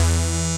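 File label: synth E, BUZZ SYNER, House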